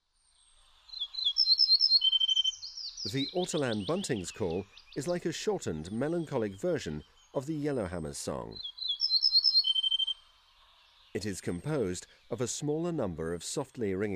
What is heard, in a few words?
ortolan bunting